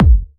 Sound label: Soft Kicks; Vengeance Samples